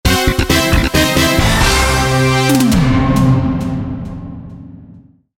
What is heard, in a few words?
Game Over Rally Music; Rally Rock 'N Racing